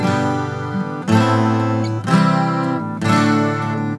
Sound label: GTR, Celtic Flavours; USB Soundscan vol.42; AKAI